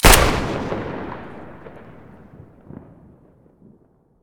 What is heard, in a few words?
saiga muzzle stereo, weapons